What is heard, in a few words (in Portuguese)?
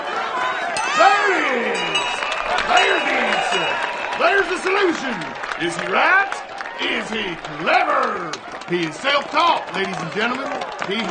feira atração galinha